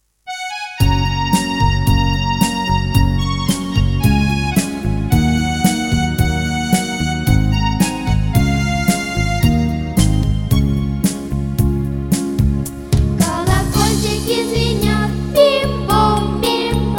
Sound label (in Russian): Колокольчики звенят, Детские песни; Детская гр. Звездочка. Наб. Челны 2007